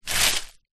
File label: wood chippings small scoop up with hands from ground; foley